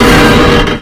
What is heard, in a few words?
Structure Crunch; The Avali's Audio Overhaul